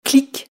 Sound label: clic